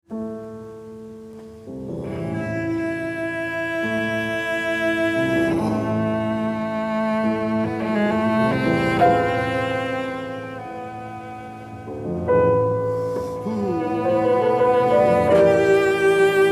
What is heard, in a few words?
Cello Sonata in D Major, Adagio con molto sentimento; Op. 102 No. 2; Beethoven